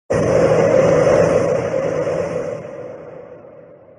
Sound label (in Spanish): Grito; Machamp Gigamax